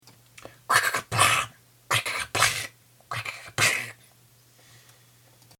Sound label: raygun